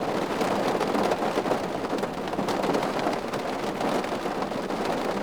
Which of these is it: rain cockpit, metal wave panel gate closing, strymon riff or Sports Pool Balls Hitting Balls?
rain cockpit